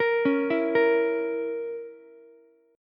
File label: A sharp Diminished Ukulele, chord; arpeggiated; JGuitar